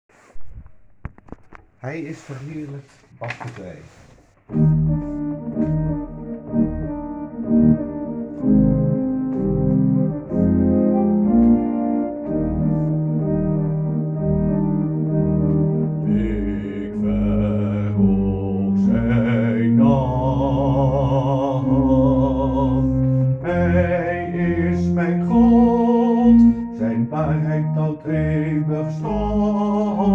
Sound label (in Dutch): bas